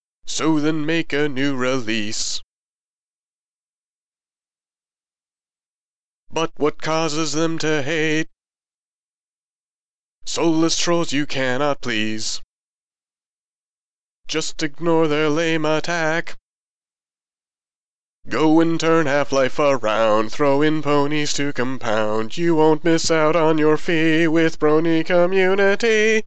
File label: Vocals Only; Brony